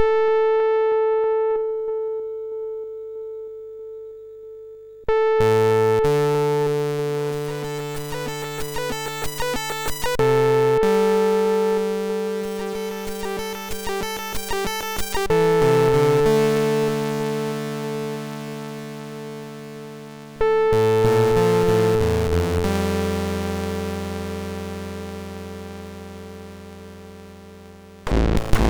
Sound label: Frantic Mix, MOS6581R3